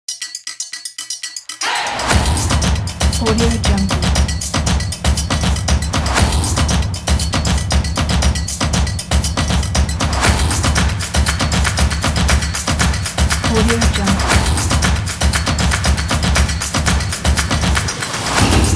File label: in drums